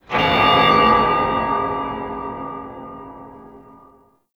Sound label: Alarm Single, Ambience, Interior; Warframe; Orokin